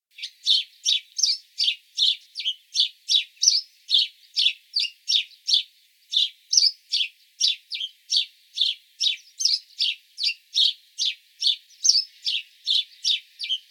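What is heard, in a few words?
polevoy vorobey golos, tree sparrow voice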